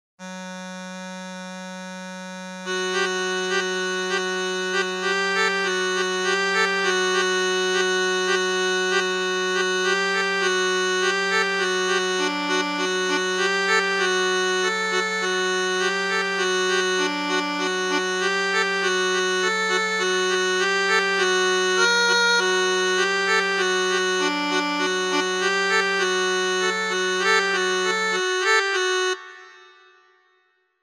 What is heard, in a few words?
been